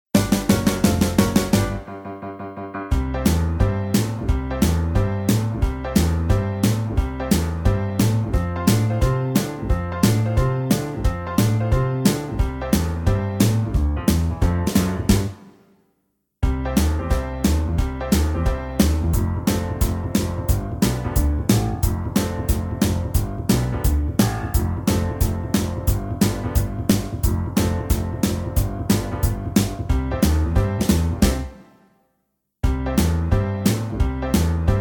Medley No Backing Vocals, backing tracks

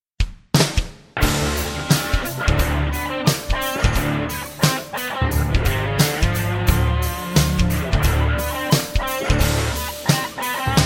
(D) No Backing Vocals

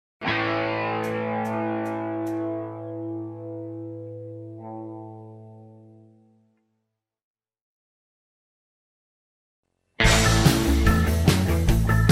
A No Backing Vocals, backing tracks

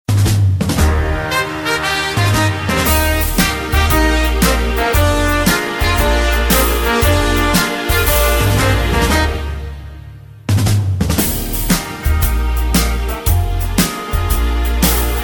(Eb) No Backing Vocals

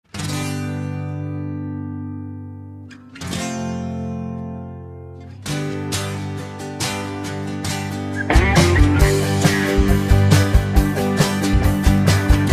(G) No Backing Vocals

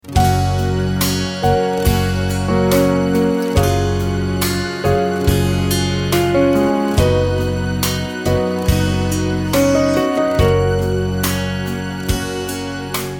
No Backing Vocals, (Gb)

